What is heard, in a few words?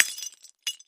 Tile Sml Square Break